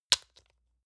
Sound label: lotion lid close hard; friseur; berufe